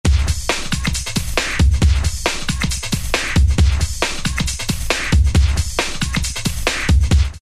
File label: New Jack 136; Dance; Roland SR-JV80-06